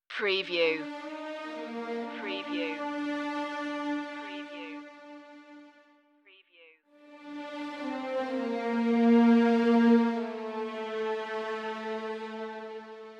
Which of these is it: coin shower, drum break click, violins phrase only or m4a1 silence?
violins phrase only